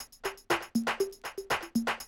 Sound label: 120, Classic Drum Loops; Best Service; XXL 1500 Classic DrumLoops; Sample CDs